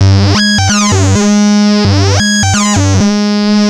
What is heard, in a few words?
Analoop, Industrial